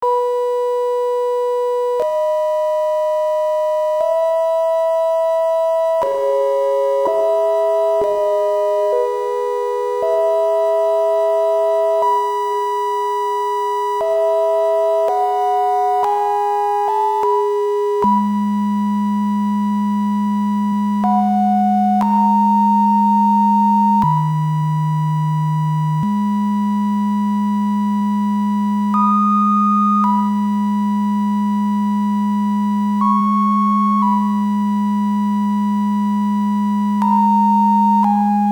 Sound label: Sinenwave